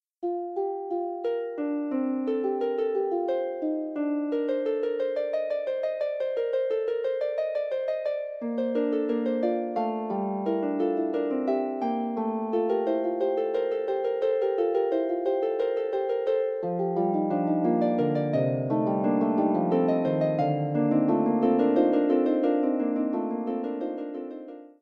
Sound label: Fugue, Harp